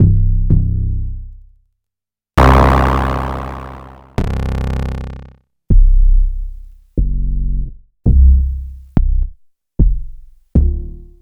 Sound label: Bass c